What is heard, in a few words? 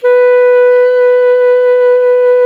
H 3, clarinettino; SoundBytes - Early Patches